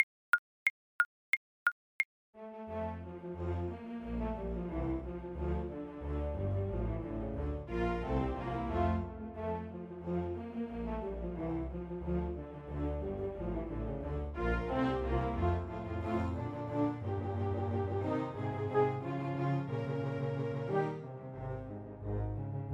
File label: Trumpet